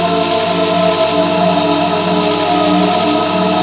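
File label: F3 voice; Northstar - The Wizard VOL-1; VOXTAGON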